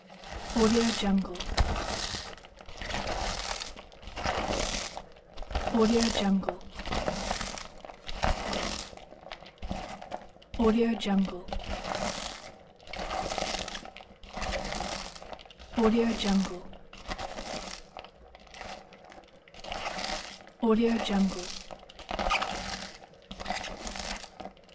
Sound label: mixing vegetable salad